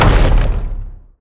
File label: ice hit low; Nut Boarding